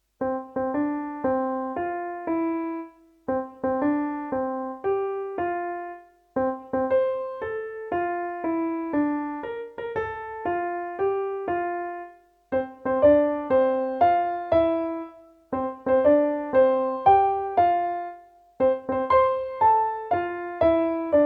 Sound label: octave fifth